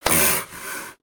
Pet Croc Happy; creatures pets; Lego

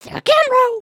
sway, emotes

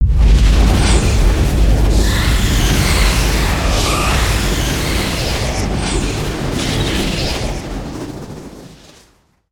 Scratch swirl; Summoner

